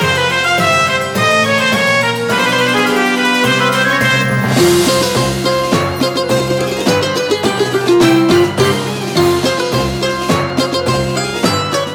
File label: Spook loop